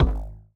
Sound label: ball bounce wave; CrossCode; battle